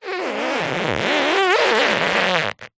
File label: industrial duct tape rub finger squeak